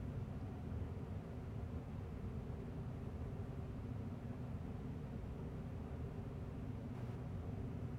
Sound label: Ambience First Floor